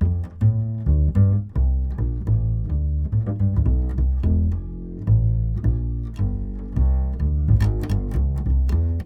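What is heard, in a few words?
106 Trad Jazz Bass F major; Pro Tools Essential Loops; Digidesign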